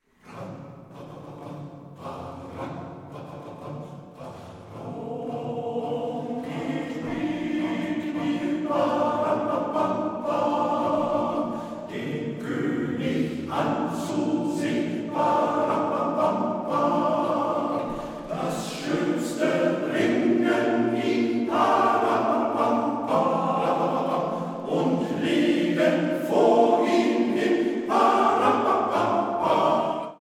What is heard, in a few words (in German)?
trommellied